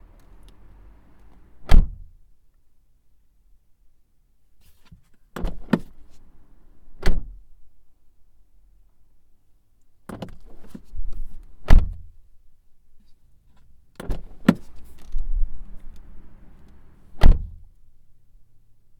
Mazda 6 III 2.0 Skyactive G foley doors open close interior; mono; Soundholder